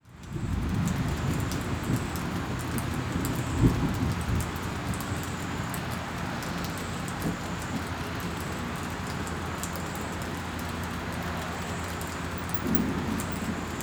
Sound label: Weather Ambience After Rain Drizzle Distant Traffic Insects; AdobeCC